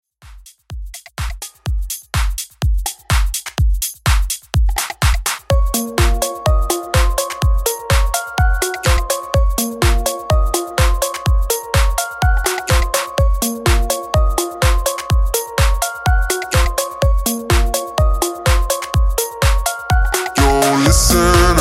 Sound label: House Rework 125 Bpm; Bootleg